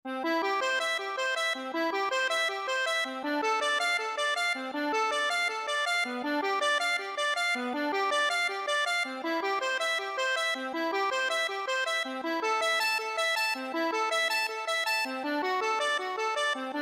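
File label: prelude guitar, accordion; bach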